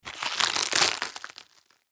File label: Snail squish break crack; slug and worm; squelchy and organic